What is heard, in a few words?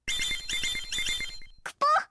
comm alert; final fantasy type-0